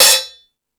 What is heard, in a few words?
Ambient Rock, E-MU Pro-Cussion